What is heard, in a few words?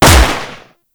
Shot, Lever Action Pistol; Warframe; Grineer